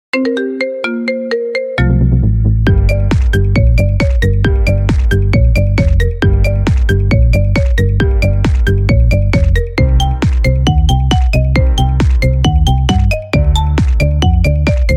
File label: marimba